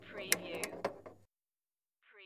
Pinball sound, ball land roll and touch metal objects